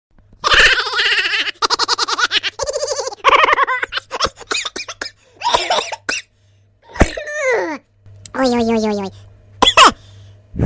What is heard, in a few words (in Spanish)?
jajaja tos